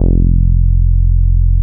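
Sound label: NORD F F2, bass; NORDY BS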